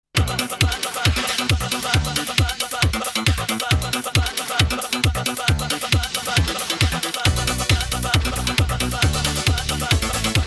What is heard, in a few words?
rock instrumental